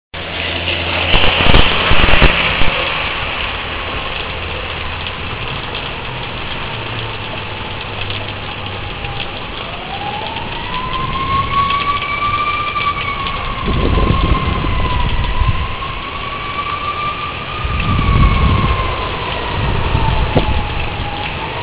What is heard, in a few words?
stormy, New Hampshire